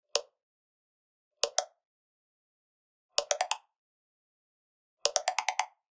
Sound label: Generic Click Digital